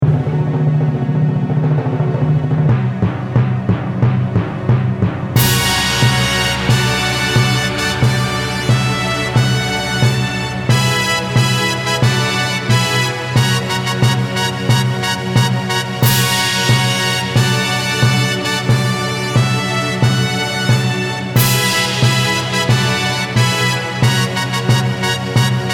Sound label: epic victory